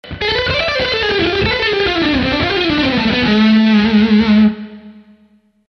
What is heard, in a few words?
Lick